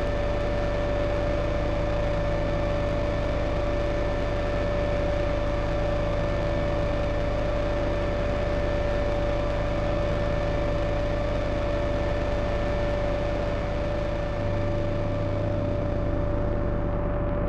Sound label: fireman music